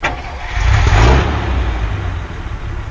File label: truck start; abbottabad compound